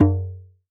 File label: Djembe - F#; percussion; Cymatics - Infinity Beta Pack 2.0; Zeus Percussion Collection